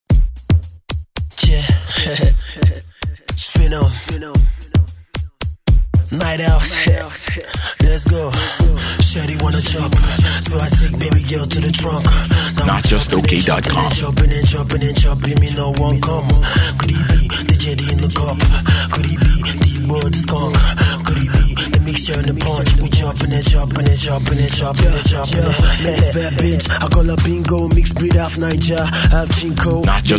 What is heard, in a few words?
Club Rock